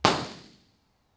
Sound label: Lecture ImpResp